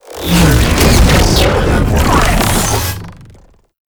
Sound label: Medic Shield Drone Cast; Abilities; Warframe; Tau; Dax Medic